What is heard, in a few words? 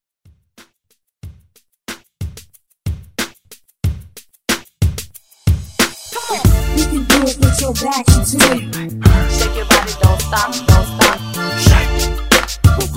Throwback Redrum Hype